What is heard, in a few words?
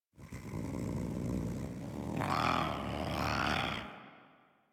Feral Vocal Idle; Warframe; Catbrow